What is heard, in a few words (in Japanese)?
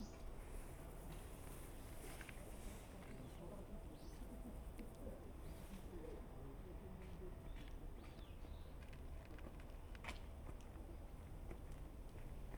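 Binaural, 電車通過; RODE NT-SF1をバイノーラルに変換し; ZOOM F6 MultiTrack Field Recorder; サウンドフォージでノーマライズしました。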